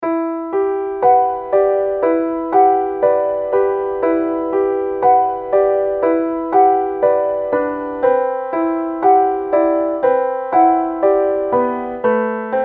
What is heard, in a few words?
piano